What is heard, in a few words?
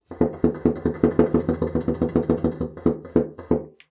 BASSOON; Xsample VOL-6